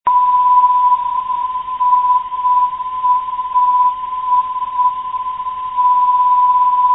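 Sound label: dd 428khz, non-directional beacon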